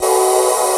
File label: SYN MALE; Q Up Arts; Dream Experience